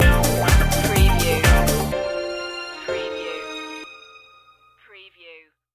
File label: street party stinger